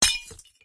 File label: respawn anchor break; smbx2; Redstone Test World